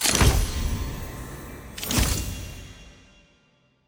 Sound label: card appear; champ select; aram